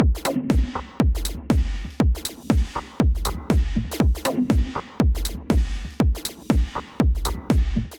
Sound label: Kit, Drums; Jackman; Ableton; Factory Packs; Punch and Tilt